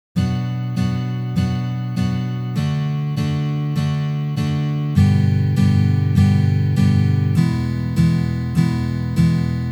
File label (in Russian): Uprazhneniya dlya igry akkorda Si minor na gitare